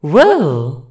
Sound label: ground pound wah; rosalina; SM64 CO-OP